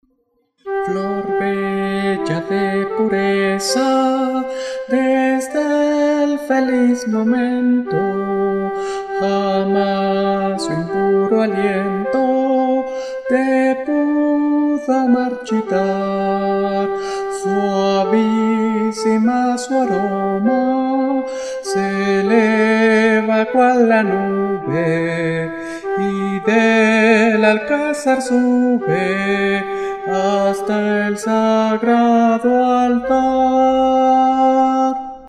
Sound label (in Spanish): voz Flauta